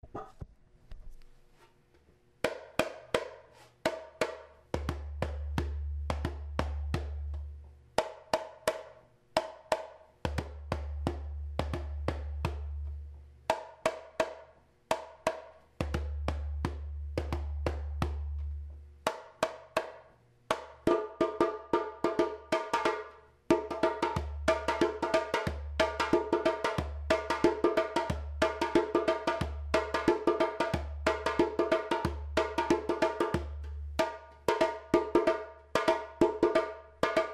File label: Lamba Jembe